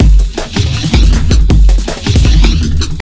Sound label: JUNGL 160, JUNGLE LOOP